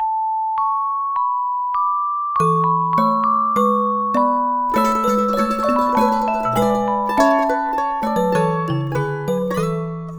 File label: Musicblock